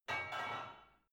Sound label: barbell only replace reverberant soft